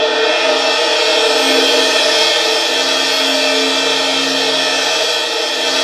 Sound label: CYMB CR; Roland - LA Composer vol. 1; STANDARD PRC